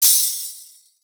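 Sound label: Tambourine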